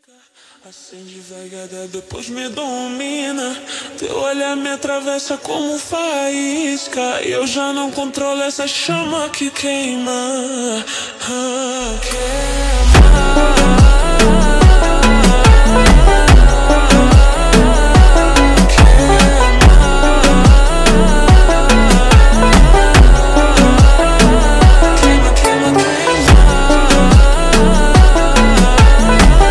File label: Montagem super Slowed